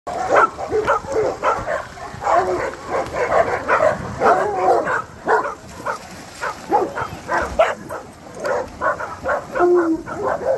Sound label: lots of dogs barking